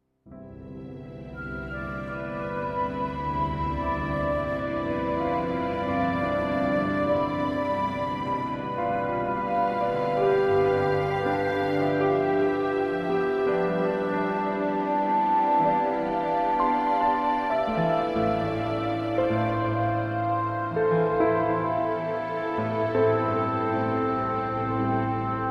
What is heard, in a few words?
Piano Concert